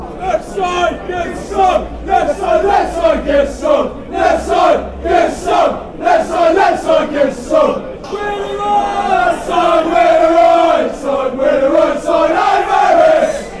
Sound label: left side give us song, fan chants